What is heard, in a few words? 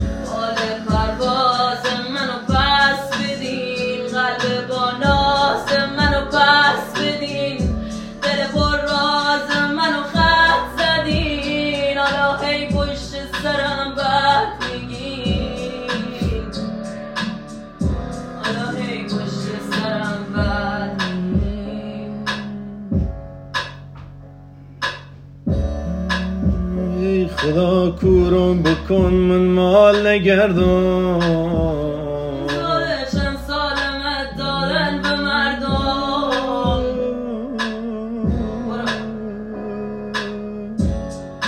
music sad; آهنگ غمگین گریه آور مخصوص اینستا